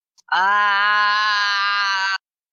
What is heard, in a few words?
aaaah